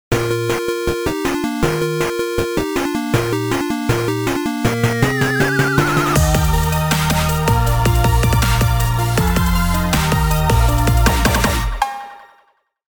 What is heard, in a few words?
808 jingle